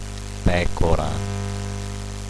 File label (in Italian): pecora